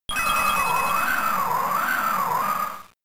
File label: Silver Wind; Pokemon; Gen 3; attack moves; RSE